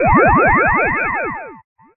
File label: Early Ghost Disappear Sound